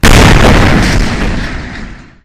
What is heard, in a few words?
boom